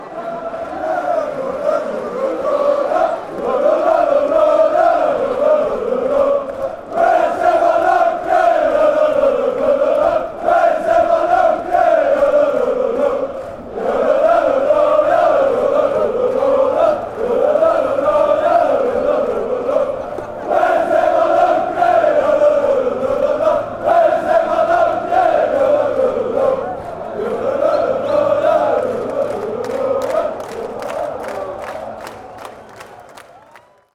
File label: lololololo, fanchants; lokeren